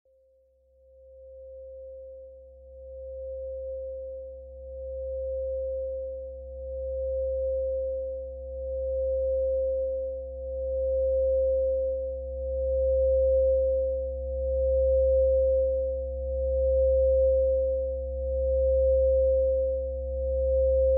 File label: 528Hz